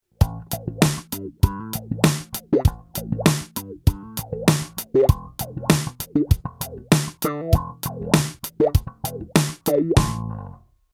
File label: funk; morley; Funk switch ON (Moon JJ210)